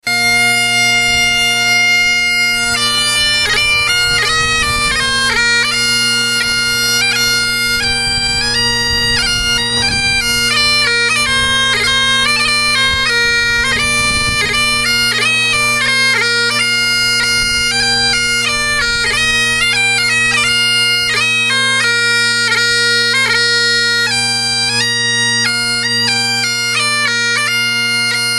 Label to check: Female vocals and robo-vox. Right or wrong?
Wrong